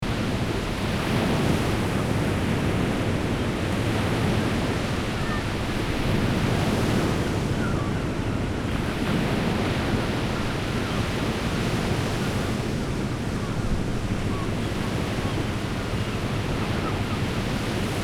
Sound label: Sea ambience with distant ship engine